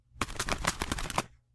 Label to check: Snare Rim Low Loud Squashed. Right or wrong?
Wrong